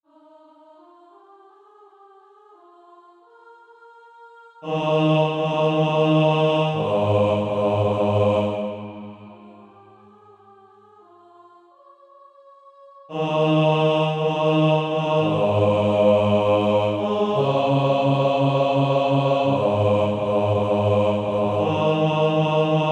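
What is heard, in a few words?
bass, Practise Music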